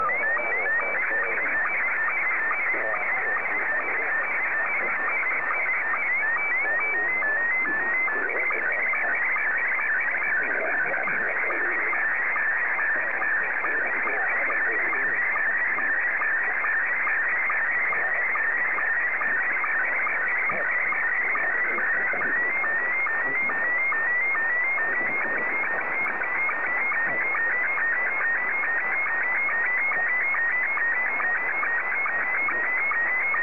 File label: sstv; ZL2TJM